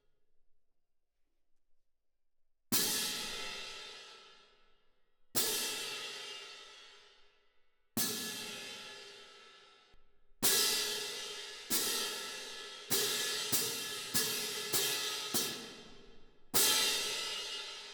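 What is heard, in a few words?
Cymbal, Jazz for piano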